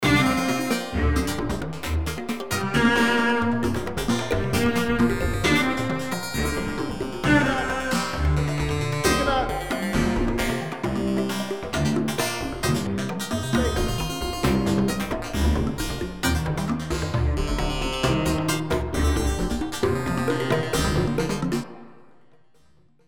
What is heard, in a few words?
drum